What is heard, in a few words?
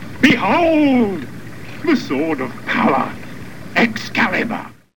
xcaliber